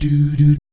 "DOO DOOT", vocal jazz fx

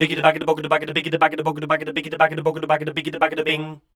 SCAT #D, Voice

